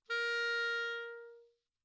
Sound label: accent, clarinet articulation